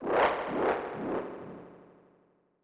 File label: Laugh; Ozzie; Super Nintendo; Chrono Trigger